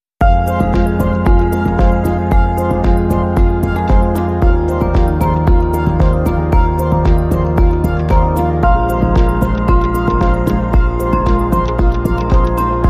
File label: Healthy Success Patient